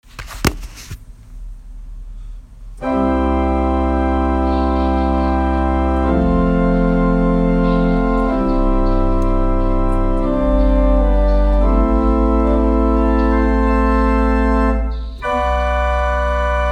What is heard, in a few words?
Full Organ